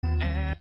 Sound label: eeh cutoff